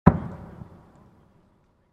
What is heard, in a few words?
MK19 Distant Explosion, MK19 Grenade Launcher Distant Explosion; Grenades; Explosions; VRSonic